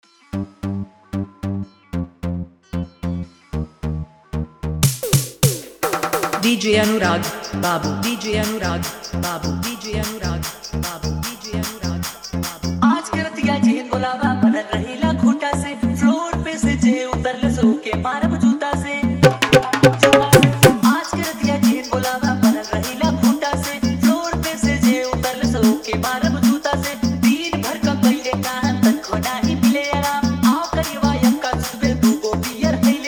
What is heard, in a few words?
Bhojpuri